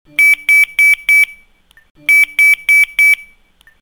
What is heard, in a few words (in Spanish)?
alerta